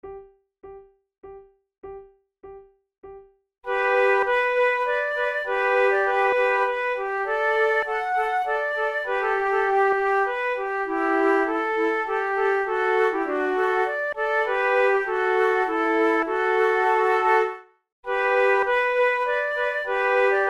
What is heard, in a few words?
minuet duet; Mozart; Don Giovanni